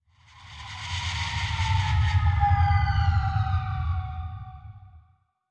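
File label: artillery projectile, tanks; War Thunder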